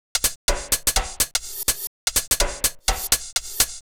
HOUSE BEATS 125

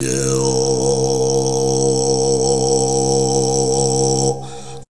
monk, ETHNIC STUFF

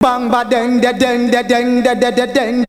BANG BADENG, VOICES

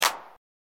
Dance Clap

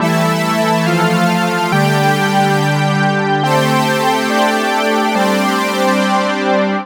TECHNO PAD 140; Keys

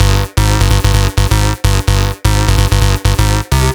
bass 128 Cm; Electro; bass loops; Anthemic

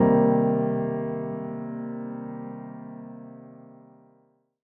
C Keys, One Shots; Touch Loops; BlissedOutBeats

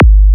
Minimal Kick, Drums, Kicks

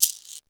SHAKER

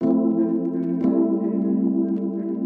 ORGAN 90 A; old rec

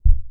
One Shot, Hits

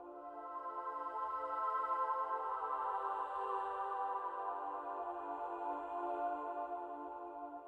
SYNTH PAD ETHNO 125 A, Sphere

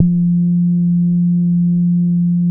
subbass F3; SE-1 BASSES

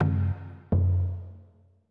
PERCLOOP ETHNO 125

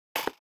Snares & Claps, One Shots

